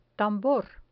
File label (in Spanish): tambor